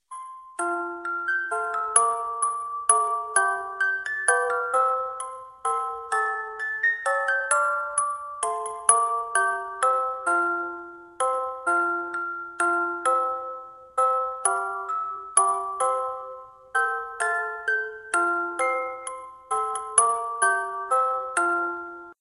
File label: music christmas